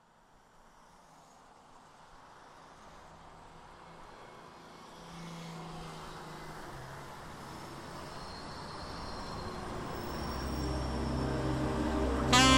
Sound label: sax